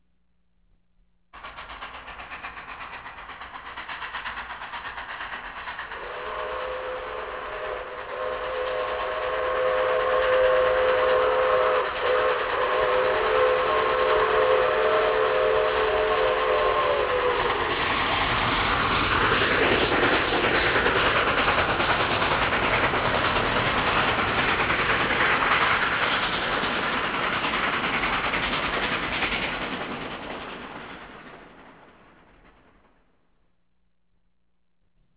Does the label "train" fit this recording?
Yes